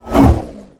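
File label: spell alteration fail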